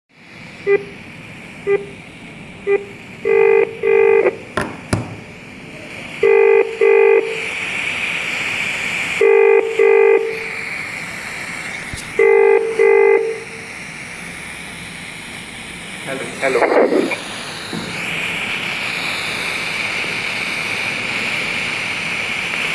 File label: Continous noise with actual sound; TLV320DAC3101; DAC TEST WITH SOUND